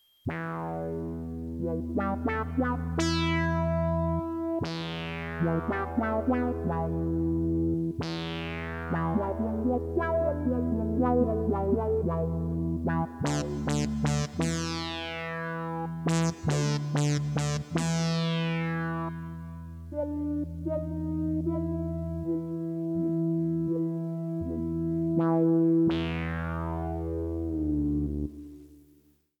heavy

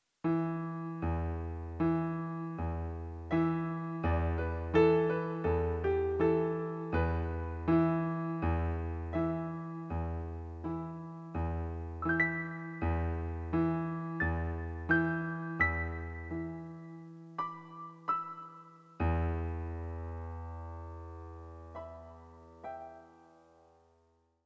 piano

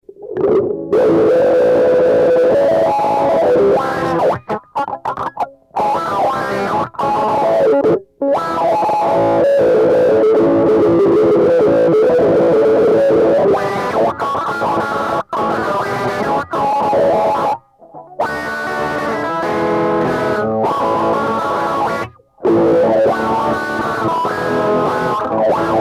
electric

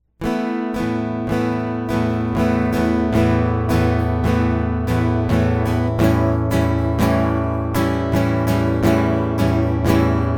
acoustic; MegaEpix

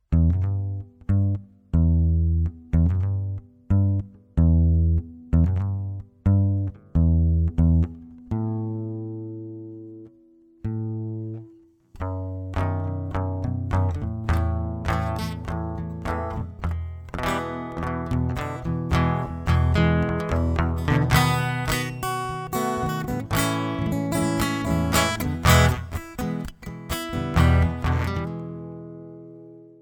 acoustic; MegaEpix

